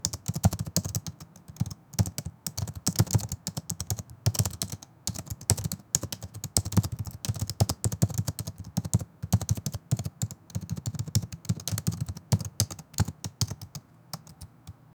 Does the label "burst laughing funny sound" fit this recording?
No